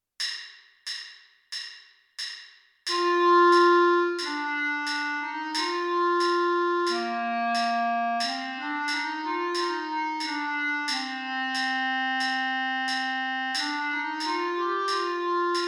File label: clarinet